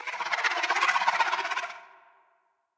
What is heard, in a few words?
creature arctic ray idle